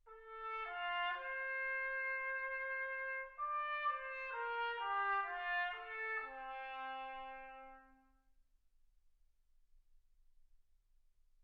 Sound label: trumpet